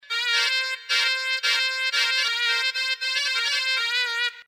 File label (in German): Mizmar; Arabische Instrumente